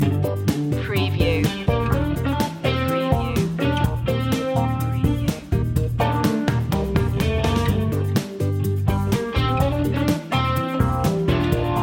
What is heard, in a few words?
got game loop